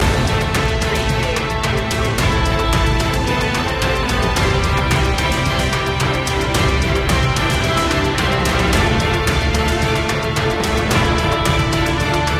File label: victory in the battle loop; media music now